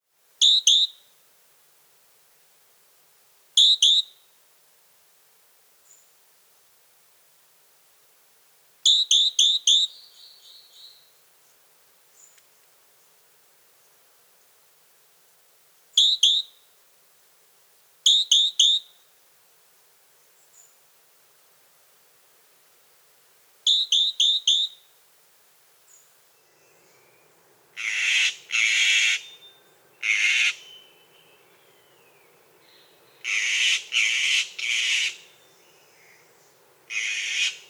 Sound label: Several call examples, including scolds, ends with contact calls at roost; N. America; Costa Rican Cloud Forest